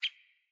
Bird Single Chirp; Paragon Bird Guide

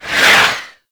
Heavy Orbital Strike Whiz By; Abilities; Warframe; Tau; Dax Heavy

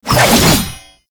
Bayonet Melee Spin Whoosh; Warframe; Tenno

